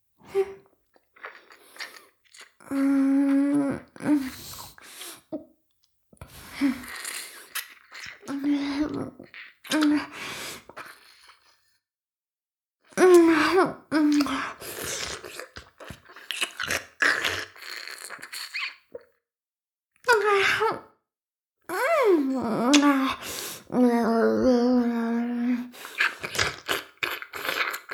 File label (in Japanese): 耳舐め音声, ASMR; 援交JK魔法少女マスカリチェリー